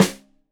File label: Rim Shot Stereo, drums